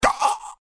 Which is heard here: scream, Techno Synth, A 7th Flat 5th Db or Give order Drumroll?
scream